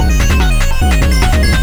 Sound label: drumloop; awe32